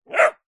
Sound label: animals dog puppy several weeks old single bark ridgeback cross bullmastiff